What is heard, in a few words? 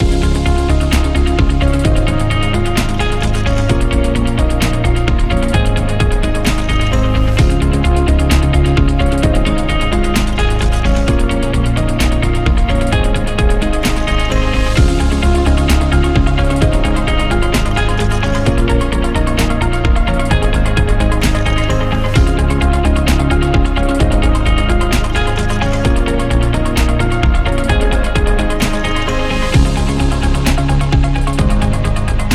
Promo Loop